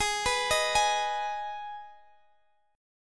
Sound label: Ab Minor Ab down Guitar DADGAD; arpeggiated